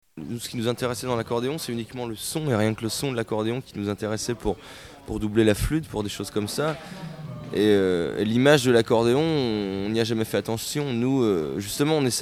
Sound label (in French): Accordeon